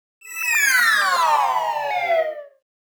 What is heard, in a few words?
122 D#m Sfx, Complex Electro Synths; Prime Loops; Invader